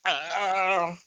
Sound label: moan